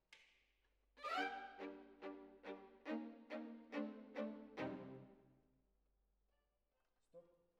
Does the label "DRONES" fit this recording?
No